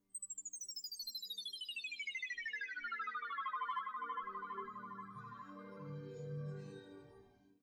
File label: Chimes